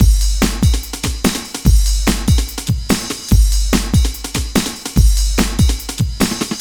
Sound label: Big Loop, Drums & Percussion, Electronic; Sonic Foundry (Sony Creative Software) - Electronic Point Blank